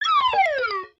Pirate Death Slide Down; foley

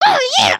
air guitar

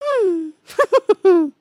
grin, emotes

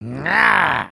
Charge; creatures organic

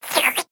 Frog Hat Slurp; creatures organic; Ribbit Frog

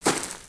Footstep; Lego; Strombie; creatures organic

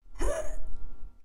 Mod Rebuild Grunt; dialog

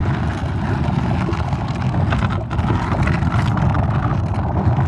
Stone Sink Loop; ninjago

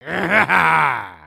knockdown; creatures organic

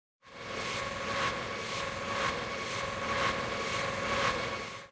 Jetpack hover

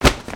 cloth short; creatures organic

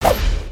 maelstrom shot; creatures organic

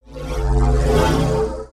Chevron Tone Short

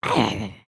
Stunned; Lego Strombie; creatures organic

